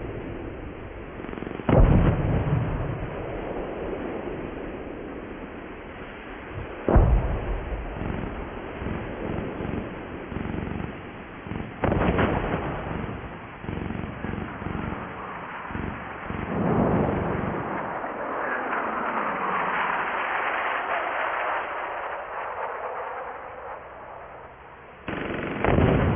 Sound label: Ambient Bridge; Call of Duty; N-Gage